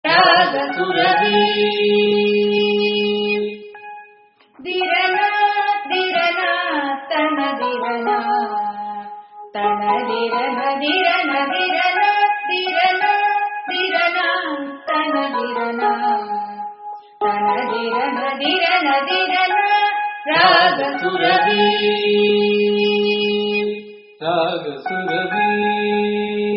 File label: theme music; ragasurabhi